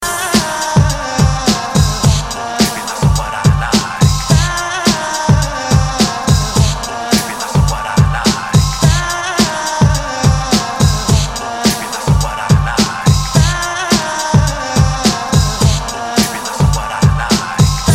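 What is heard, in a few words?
Sweet Rhythm Melody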